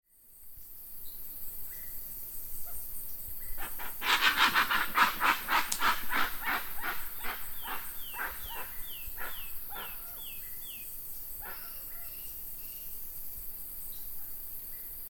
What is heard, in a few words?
Penelope obscura Jacuaçu